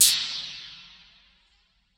Hihat Closed; Ableton Live 12 Lite; Core Library; Drums

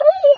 whine, dog; There; ThereClient

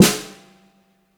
Snare Dry Funk

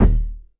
Kick Hard; One Shots; Drums; Vapour

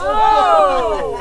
oooh laugh; Game Maker 8

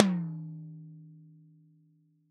Tom 8 Inch, Drums; One Shots

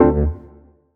Wurli Piano Dmin; Piano & Keys

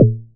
Tom Bonk; Drums

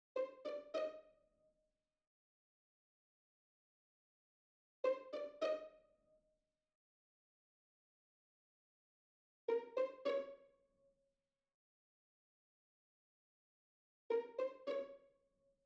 Full Strings Pizz Hi; Sony; ACID Pro 7.0

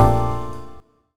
Stab Jazz Bb

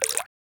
Water, FX Hit; Drums; Ableton Live 12 Lite; Core Library

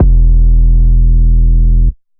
808 Heavy E, Synth

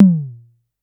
Tom High Mid; One Shots; Drums

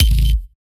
Kick Sci Fi

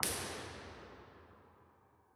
Real Places, Impulse Responses; Soulac Front L; Ableton Live 12 Lite; Hybrid

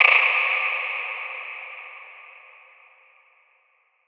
Textures Vocal OE; Impulse Responses; Ableton Live 12 Lite; Hybrid

